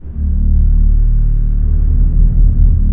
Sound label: drone, Pipe Clusters; E-MU Producer Series Vol. 5 – 3-D Audio Collection; 3DSFX